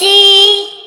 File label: normal hit whistle; osu